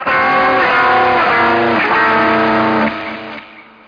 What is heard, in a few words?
rock bit